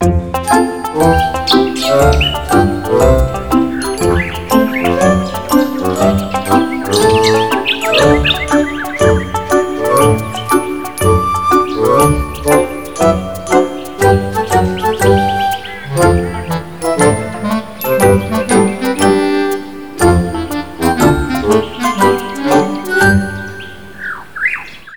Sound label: BLOOP; The Ghost Cave